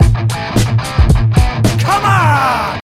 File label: Guitar